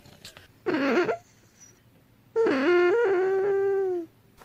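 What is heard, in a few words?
badger; barsuk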